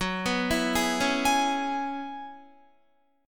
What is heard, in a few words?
F sharp 9th Suspended 4th F sharp Guitar; F#9sus4 Chord; arpeggiated; 13,14,16,13,13,16